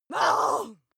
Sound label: witch scream groan short